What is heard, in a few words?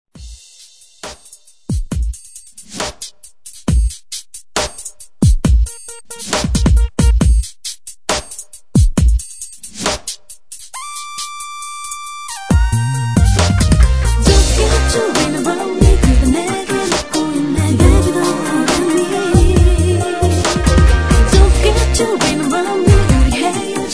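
Session Guitar Track Kpop; Sony Ivy Ent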